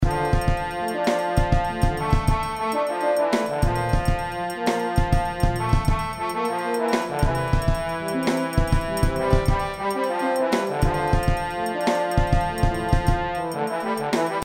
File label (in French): Trombone tuba